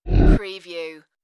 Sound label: Wipe Low